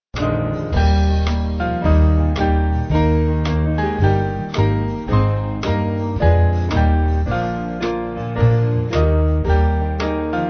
band, Music Backing Files for Churches; Hudson; Alas! and did my Savior bleed

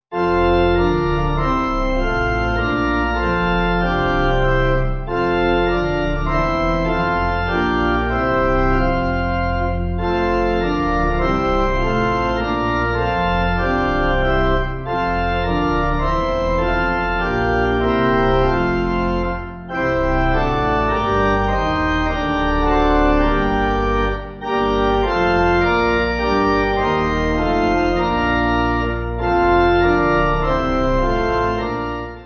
Pipe

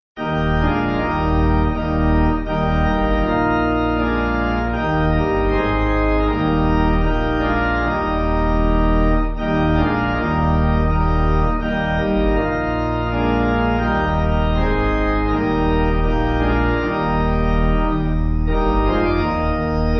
Pipe; Come Thou Fount; Nettleton

